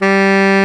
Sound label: TENOR SAX G3; Greytsounds VOL-1